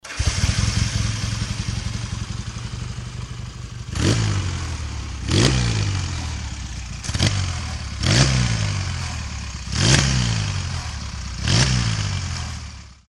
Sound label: laverda 750, motos